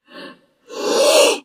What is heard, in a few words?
Cobra Indian Hiss Clo, snakes